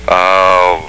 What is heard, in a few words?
aaooww